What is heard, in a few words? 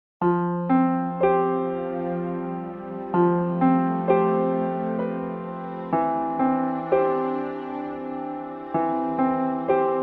Lyrical; advanced 2 istd